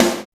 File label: DANCE SET, DRUMS